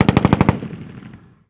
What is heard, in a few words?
gun usassault med